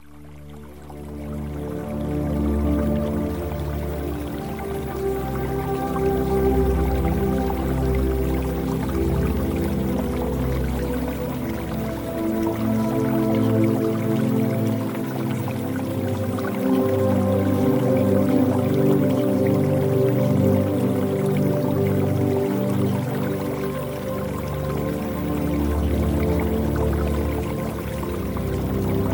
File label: Music